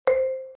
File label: countdown first